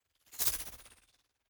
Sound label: fly by insect drone fast